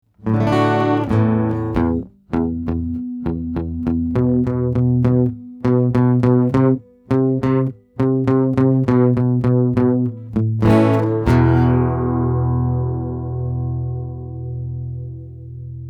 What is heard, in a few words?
Chorus